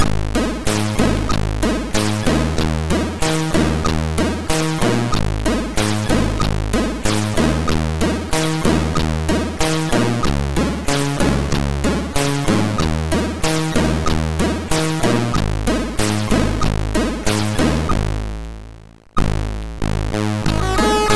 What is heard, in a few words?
sid MOS6581R3; Alien Syndrome preview